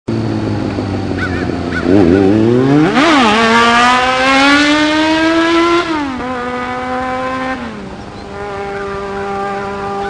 Kawasaki ZX 7R

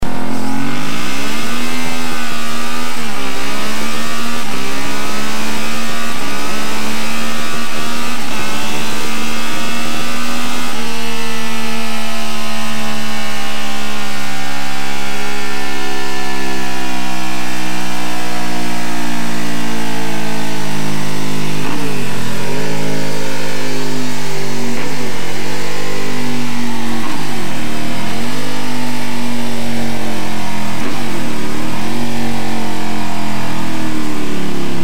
Honda VTR1000 Superhawk ardine pipes, motoskanas

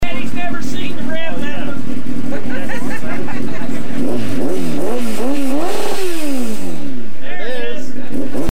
2000 Kawasaki ZX9

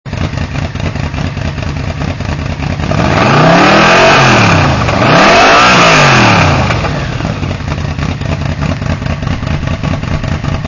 Vmax

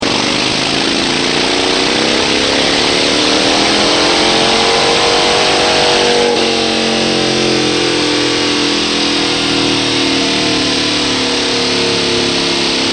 Harley 888 open pipes, motoskanas

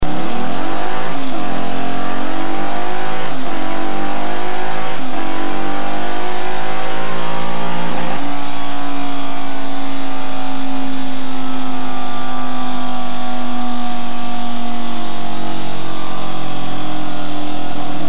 1999 Buell Lightning X1

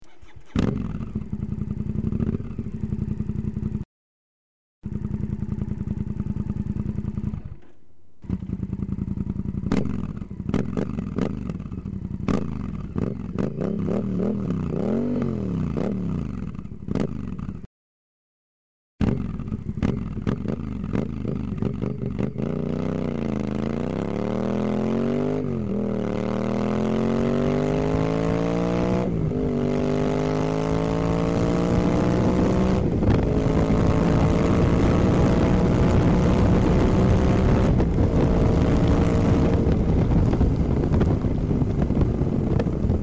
1999 Honda VTR1000 Superhawk Devil System

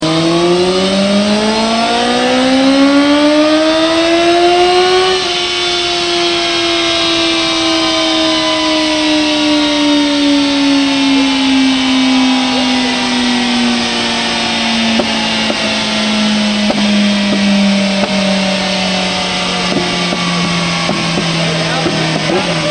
Yamaha R1 slip on, motoskanas

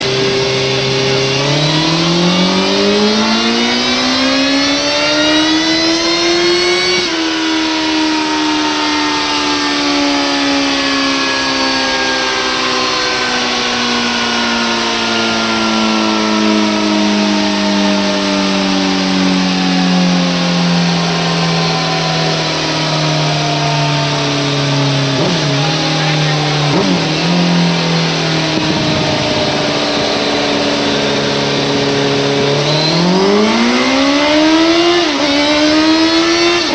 Suzuki Hyabusa yosh pipes, motoskanas

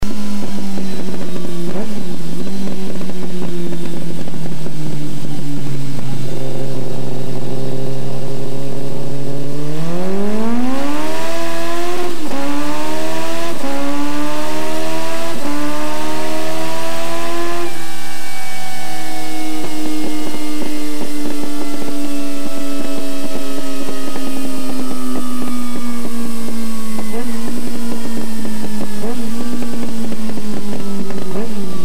1999 Kawasaki ZRX1100 muzzy pipe

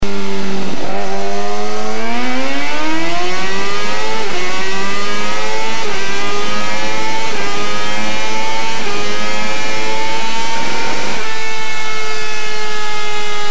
Suzuki GSXR750 pipe; 2000; hindle pipe

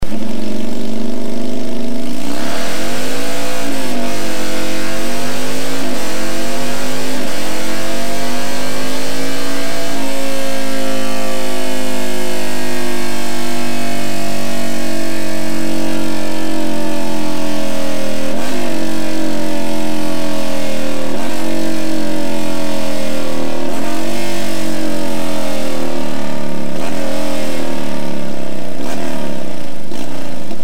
Buell Lightning X1 open pipes

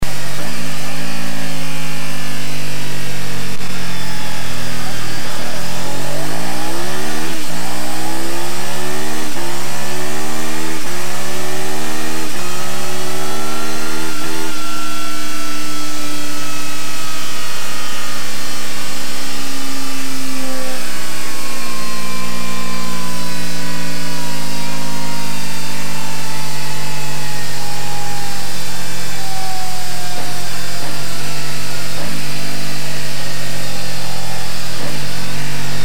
Honda RC51

